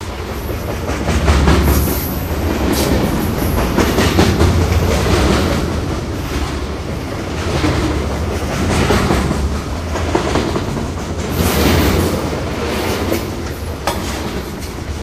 outside track, train; video games; source engine; portal2

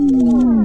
dog disappointed, npc; Source Engine; video game

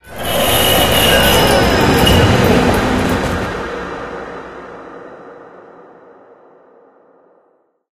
tram; video games; ambient; Source Engine; tf; lair

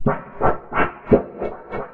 foley wobble; player

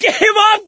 scream while pounced, survivor; voice; source engine; left 4 dead 2

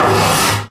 metal frame scrape; Source Engine; Portal 2

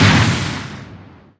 giant common step

